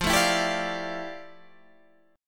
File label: F Major 13th Guitar Standard; FM13 strummed